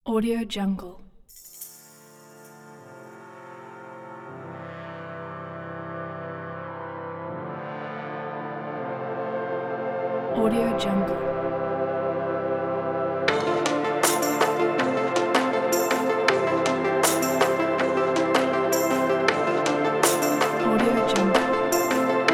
action background

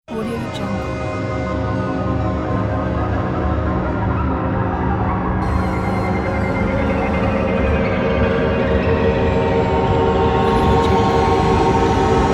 space drone

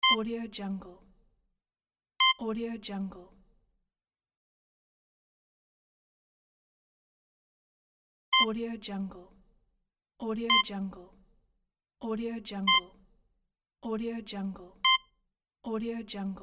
life support machine

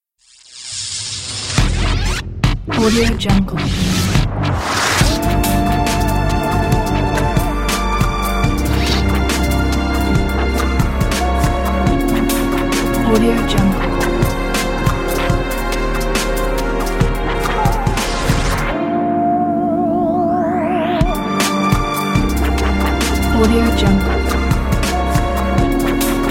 electro dubstep; vistula-waves

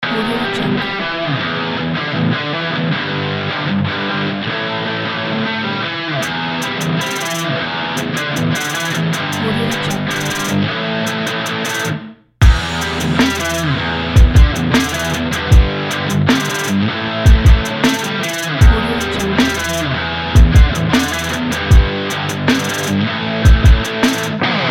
sport hip hop rock

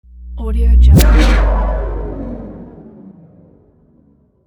phase transition scifi

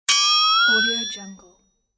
cartoon flexatone comedy accent

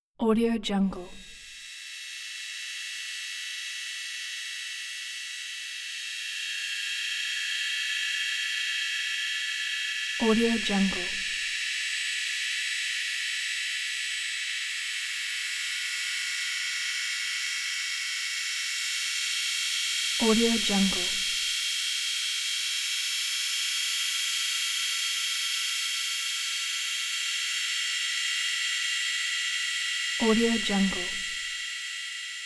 eerie breathy metallic drone ambience; hollywoodedge

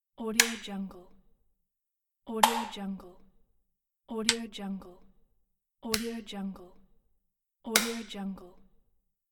switching on indoors

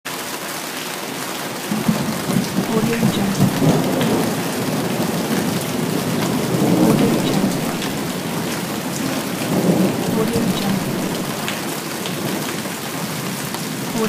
rain hail and thunder

